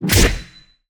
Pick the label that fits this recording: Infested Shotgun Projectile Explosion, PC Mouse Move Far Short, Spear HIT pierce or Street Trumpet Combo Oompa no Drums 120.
Spear HIT pierce